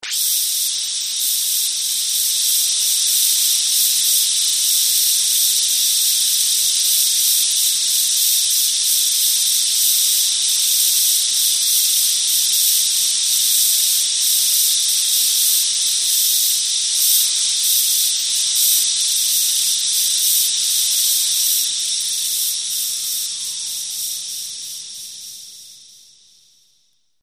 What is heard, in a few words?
Gas Release Steady